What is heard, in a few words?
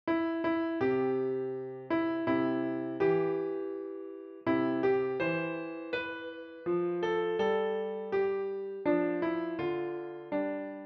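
piano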